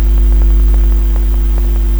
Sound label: Noise; Ableton Live 10 Suite